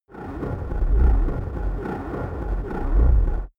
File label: abstract tension, energie